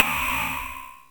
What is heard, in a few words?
Snare, Korg Z1